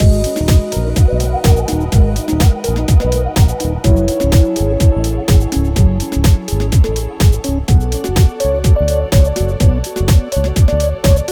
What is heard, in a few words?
loop